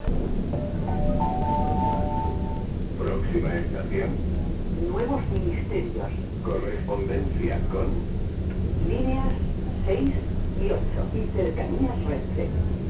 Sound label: madrid, bus